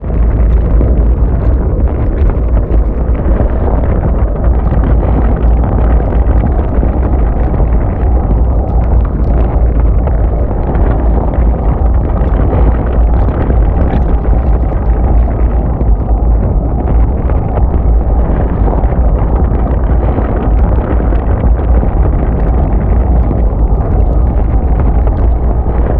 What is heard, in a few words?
black project cinematic ambience; Bluezone Corporation; Organic Sound Effects; Steampunk